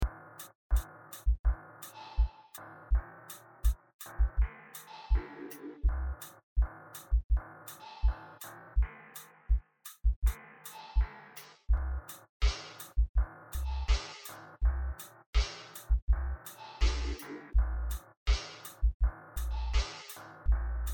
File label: Sine Wave